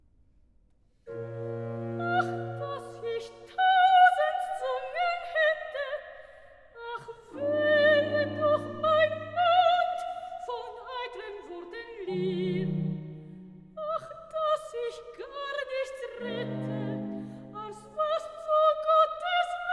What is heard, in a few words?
Recitative; Lobe den Herrn, meine Seele, BWV 69a; Ach, dass ich tausend Zungen hatte! (Soprano); Bach; J S Bach; Cantatas, Vol 13